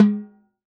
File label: Timbal, World Percussion; Drum Samples; Cymatics; PHARAOH Beta Pack; Pharaoh Premium Drum Samples (BETA)